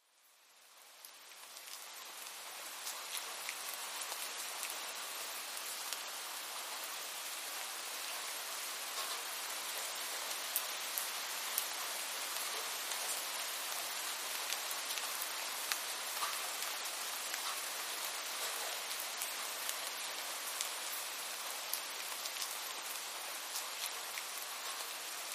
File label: light rain ambient